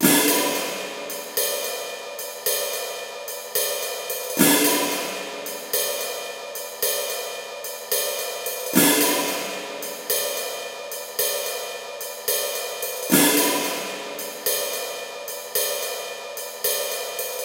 Rides - 110BPM; Percussion Kits; STEMS; Ghosthack; SkyRealm; Kit 03 - Border Patrol

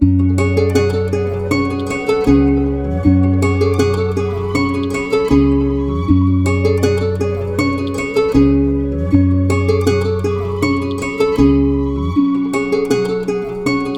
158 BPM F# Min, Melodies; City Of God; Cymatics; Immortal Production Suite Beta

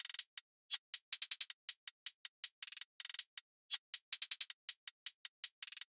Hihat Loop, 160 BPM; Drum Loops; Cymatics; Cobra Hip Hop Sample Pack

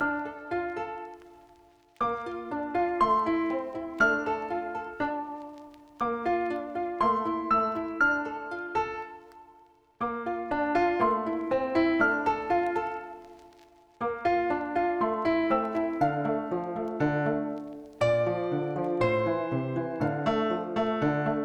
Keys, 120 BPM D Min; Origins

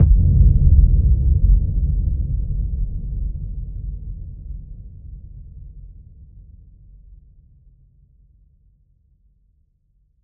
Drone, Hits

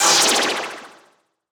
Impact FX

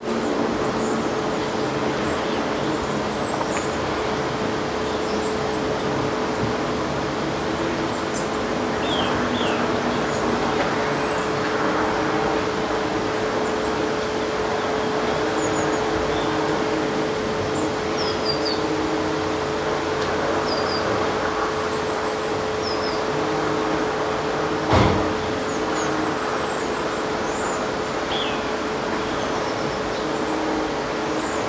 Live Recording (Birdsong); Cymatics; ANALOG EVOLUTION Beta

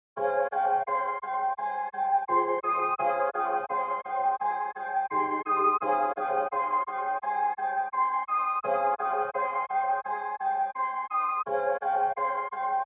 85 BPM F Min Chops; Melody; Reverse; Loop Stems; Cymatics; 2022 Melody Collection - Reverse; Winter

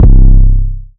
808 - C, trap; 808s; Apex 808; Cymatics; Trap Starter Pack

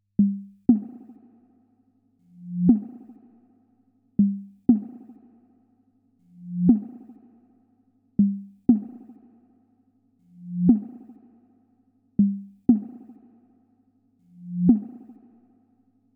120 BPM F Min Percussion; Loop Stems; Cymatics; 2022 Melody Collection Platinum Expansion - Pt 1; Frozen

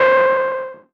PLUCK Transmission - C, Synth One Shots

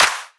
Dubstep Clap; Claps; Drums; One Shots

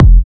Kick; Buffalo

